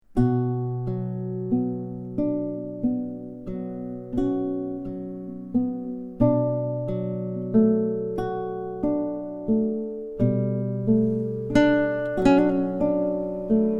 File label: Meditation One for guitar and cello